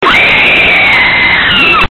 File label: Scream